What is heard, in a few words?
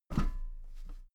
step metal ladder step top soft